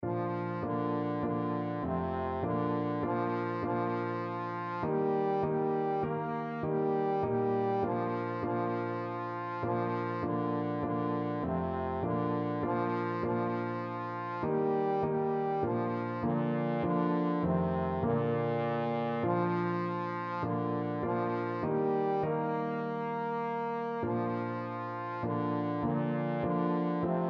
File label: trombone